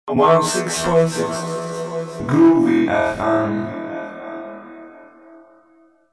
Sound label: groovy, jingle